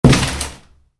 Hit box, Box, Cardboard: Impact, Slight Box Movement; Miscellaneous Impact